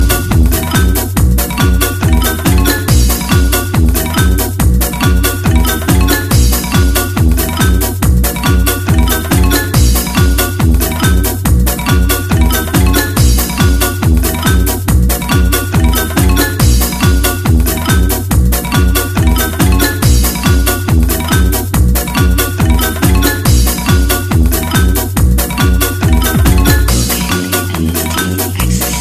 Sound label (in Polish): Cymbalki